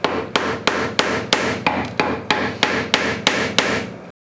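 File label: chisel mallet; wood chisl; chisl mallet new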